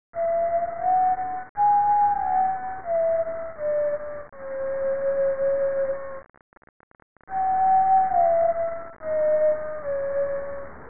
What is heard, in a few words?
whistle